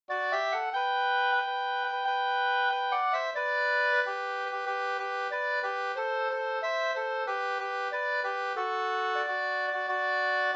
brudmarsch; fr Gudbrandsdalen

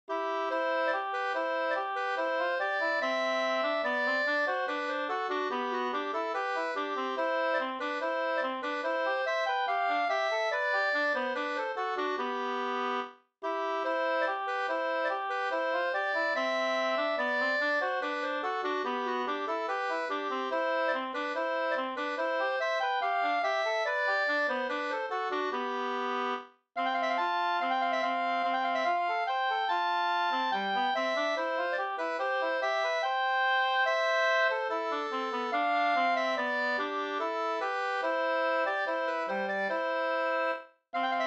ganglat; e JA Tornblom Skog SvL

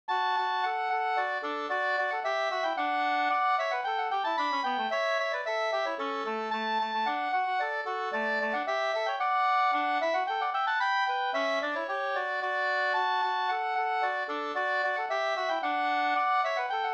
slängpolska

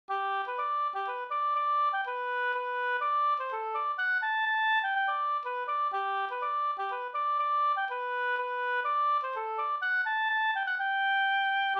hamburska; Trallpolskan fr Sodertalje

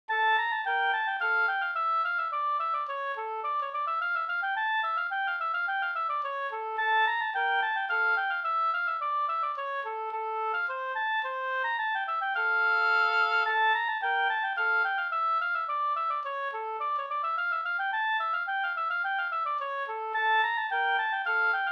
slängpolska; upptecknad av K. P. Leffler efter Pehr Ericsson, Granlund, Helgarö, Södermanland

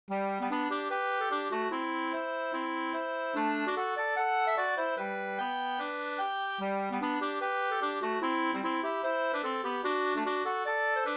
schottis; av Janne Kranz 2st

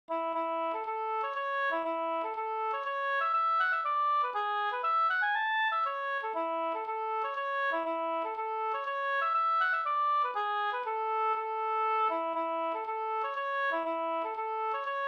polska Bodapolska

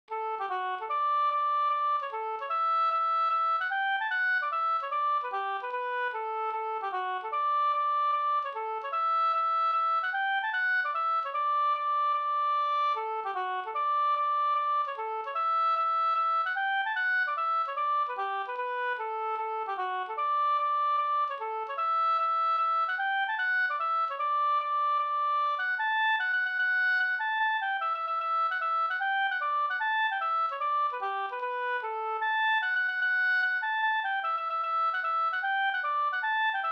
reinlender; fr Gruer finnskog e Gunnar Osthaug

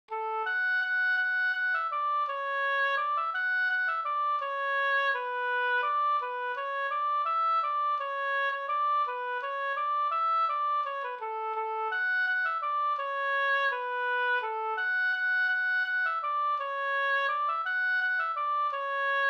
vismelodi; Skata satt pa taket; Vingaker

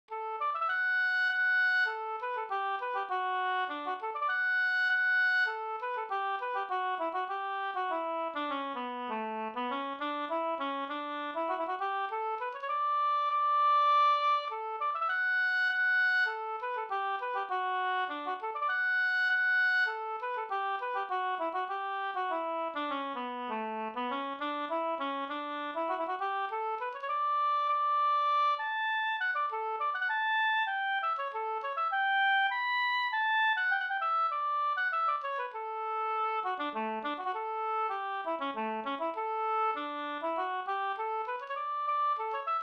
polska Bingsjopolska; Bingsjotolvan e Pekkos Helmer

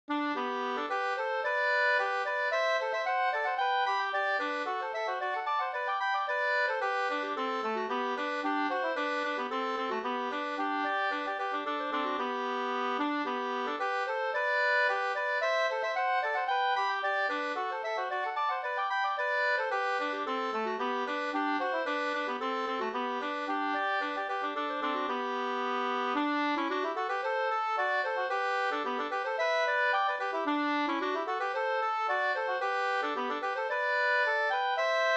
skanklat

